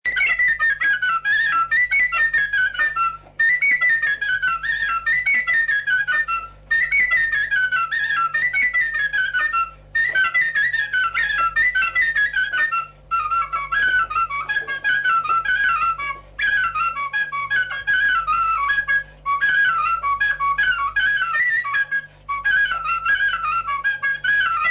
Batuta I la fluier, jocuri si cantece batranesti; Vaslui

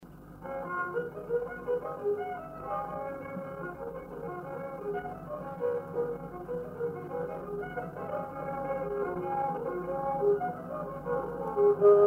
Coasa la vioara; jocuri si cantece batranesti; Vaslui

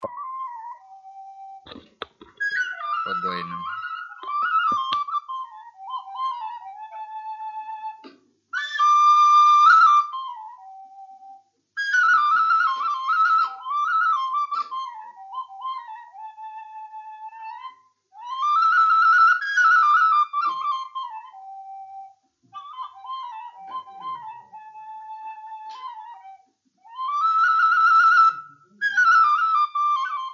Doina fluier; Vaslui

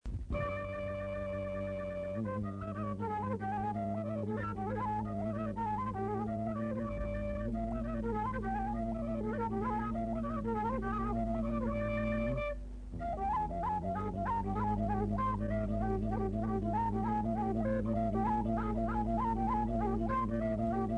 Sarba cu gat la fluier; jocuri si cantece batranesti; Vaslui

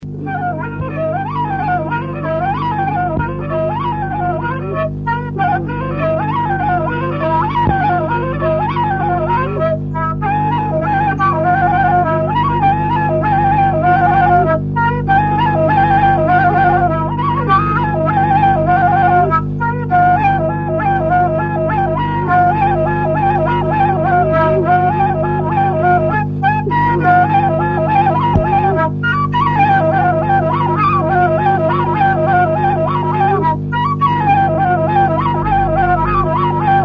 Braul la fluier, jocuri si cantece batranesti; Vaslui

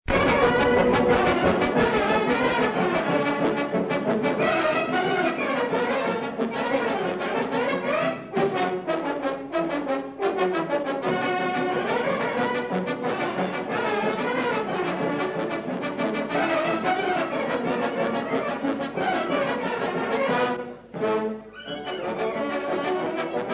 Cantece de joc fanfara; de la Zece Prajini; Fonoteca Arhivei de Folclor Vasile Adascalitei - CJCPCT Vaslui; jocuri si cantece batranesti

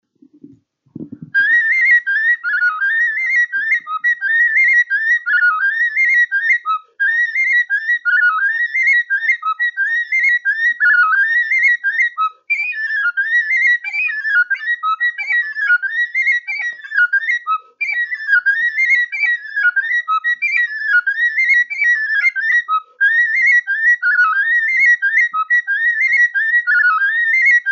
Burdui fluier; de o negura de vreme; Vaslui

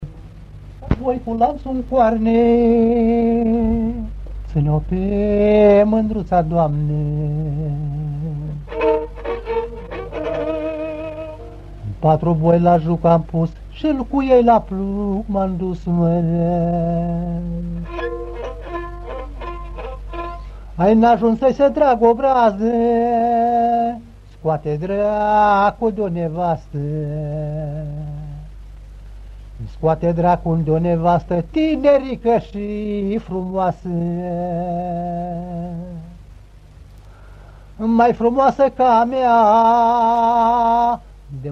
Patru boi cu lantu n coarne I voce si vioara; jocuri si cantece batranesti; Vaslui

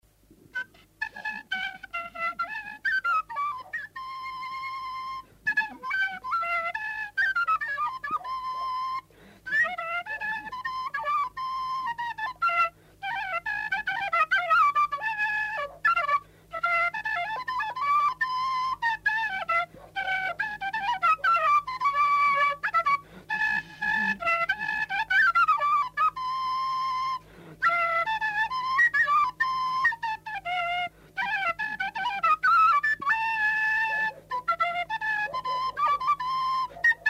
Hora fluier; Vaslui

